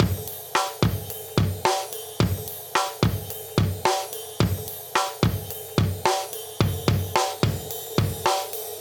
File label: 109 Break